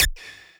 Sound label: lead a